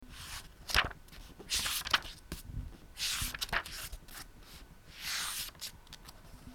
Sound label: book; 本をめくる音２